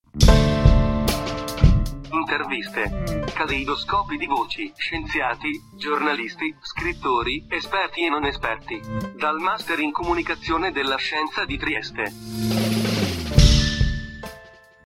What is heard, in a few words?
jingle interviste